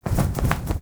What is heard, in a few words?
Owl Pre Hover To Hover; Warframe; Duviri; Animals